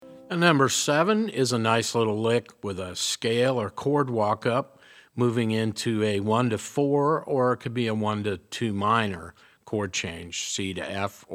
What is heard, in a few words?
resonator pedal, steel guitar